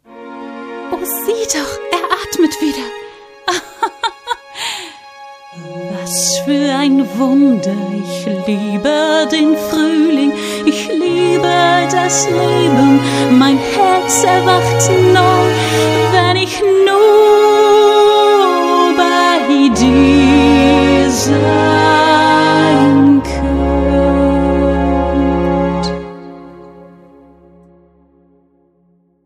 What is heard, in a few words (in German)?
gesang zeichentrick